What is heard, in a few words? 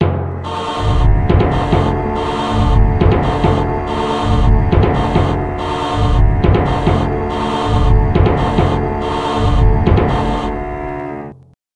suspense